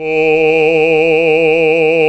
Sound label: BASS, Roland; Sound & Vision - Gigapack II CD 1; GREG. BASSES